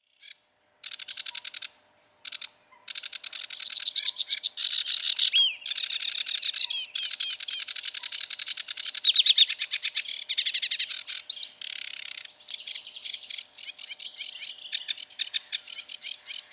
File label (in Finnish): ruokokerttunen